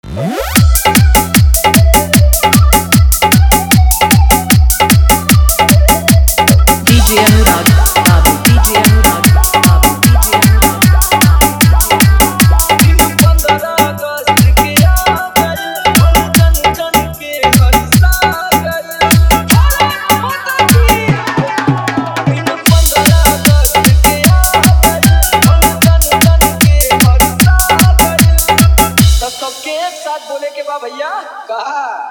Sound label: Desh Bhakti Geet Full Hard Bass Mix